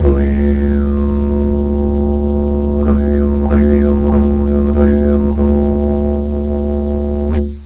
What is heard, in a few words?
didge